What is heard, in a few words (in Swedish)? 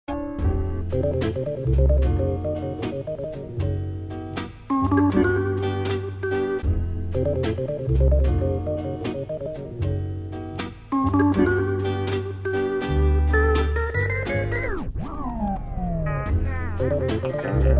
hockeyorgel; ultrabuketten